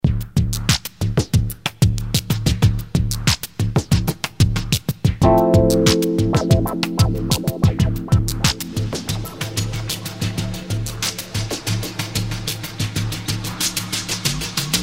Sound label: AN1X GROOVE